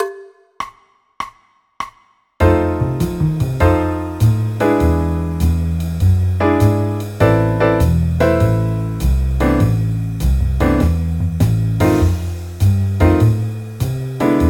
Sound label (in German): a moll akkordverbindungen